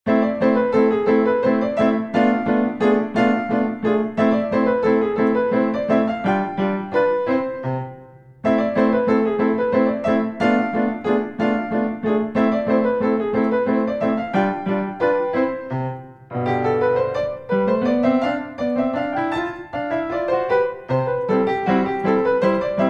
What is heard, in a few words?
scherzo, piano; Weber